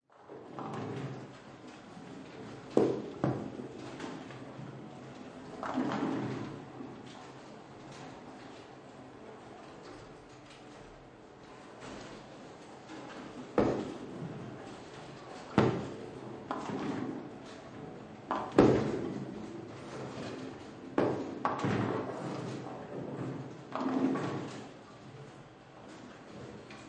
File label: Sports Bowling Alley Ambience More Active; Sound Effects:Sports